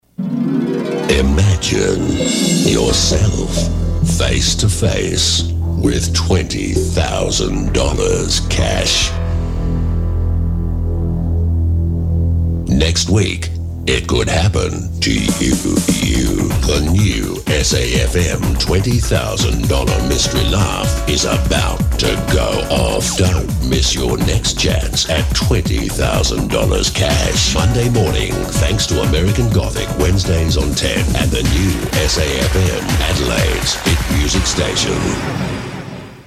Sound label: Mystery Laugh